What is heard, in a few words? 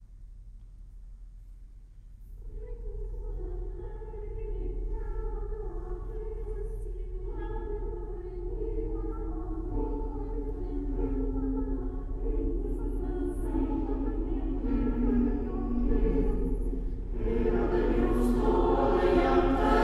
Ring Christmas bells